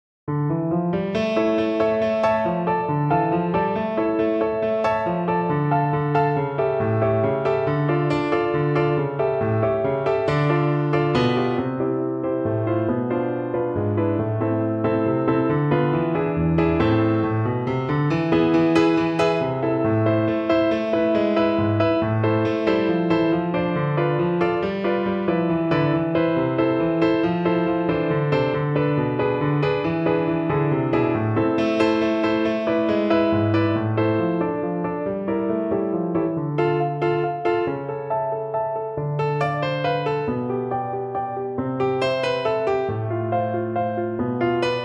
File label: danza danza FL kar, Flute